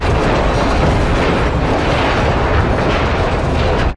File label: damage vehicle; World Of Warships